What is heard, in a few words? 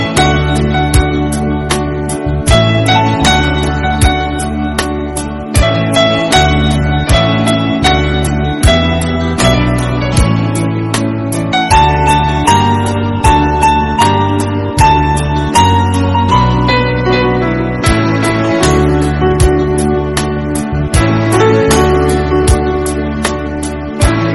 Instrumental BGM Telugu Ringtone